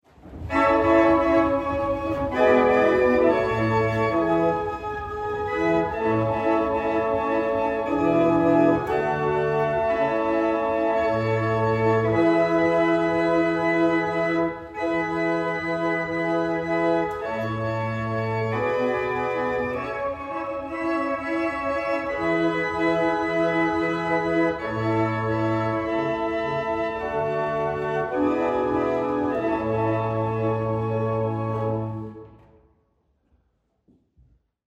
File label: Double chant, barrel organ; Norton